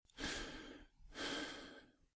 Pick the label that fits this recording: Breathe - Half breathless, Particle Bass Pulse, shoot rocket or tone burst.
Breathe - Half breathless